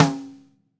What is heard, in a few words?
Radio King Snare Rimshot Room; Sonic Foundry (Sony Creative Software); Vital Drums